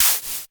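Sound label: Klone Dual Percussion Synthesiser noise mod; KLONE FX NW8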